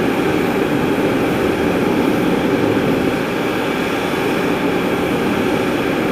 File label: big steam vent; Titanfall 2